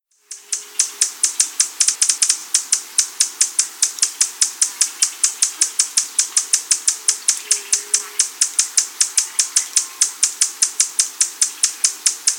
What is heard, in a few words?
buff belied humm